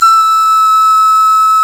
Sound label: PANFL, Pan-Flute; Sound & Vision - Gigapack I CD 2 (Roland); Brass&Woods2